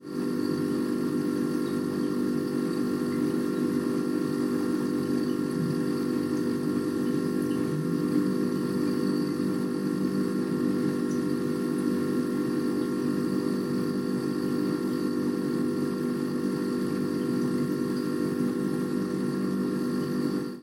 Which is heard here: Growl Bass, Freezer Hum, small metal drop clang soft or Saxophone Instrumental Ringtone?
Freezer Hum